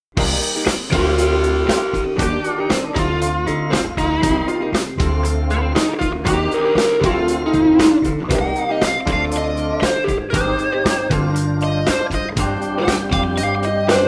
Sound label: guitar solo intro